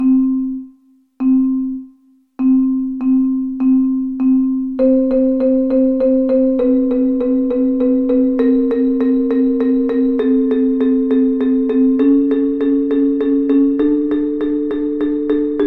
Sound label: front ensemble med, drum music; marching; Warm-Ups